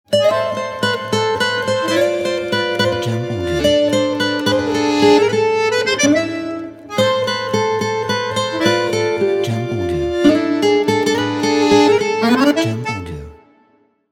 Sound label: Paris French Intro Logo